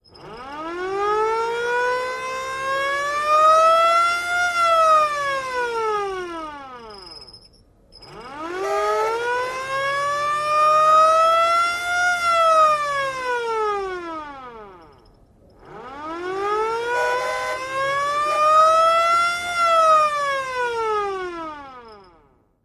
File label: Hand-turned Siren: Operates. Stereo; sirens